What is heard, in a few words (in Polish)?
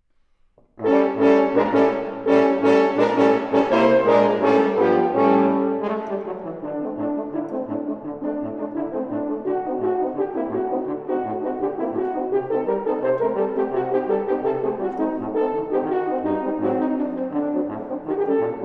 Freilach melodia zydowska, kwartet waltornia